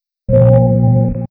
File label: Startup & Shutdown; Windows NT 1.01-2.03 Startup & Shutdown; Windows Never Released Soundpack 1